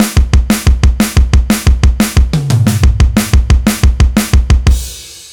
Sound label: Acoustic Drums